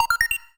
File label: 3 beeps